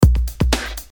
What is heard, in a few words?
Beat